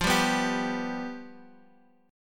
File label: F Suspended 2nd Flat 5th